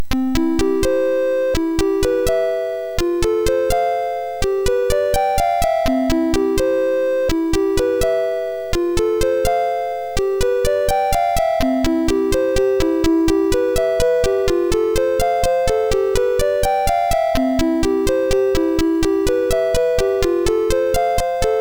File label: Magical Darkness, MOS6581R3; hvsc